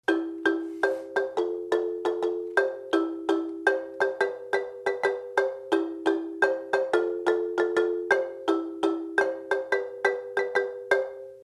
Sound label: high chords, marimba